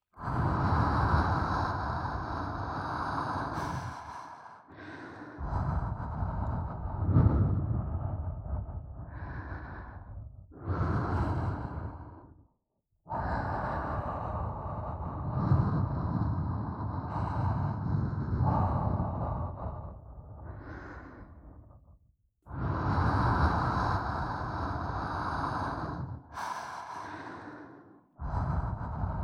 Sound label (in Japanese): 吐息